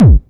Electronic Kick

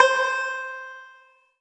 Kawai K11 Banjo C5

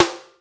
Snare Drum

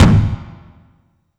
Slam

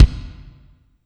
Bass Drum

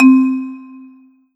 Roland SC-88 Marimba C4

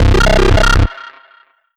M-Audio Venom Sync Bass C2; Bass - Synth

